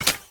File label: zombie step; smite; ahpuch skin10